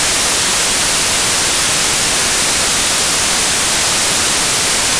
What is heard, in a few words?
uniform white noise